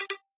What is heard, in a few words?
camera focus, ui; BenQ B502